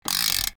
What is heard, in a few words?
Crank sound tight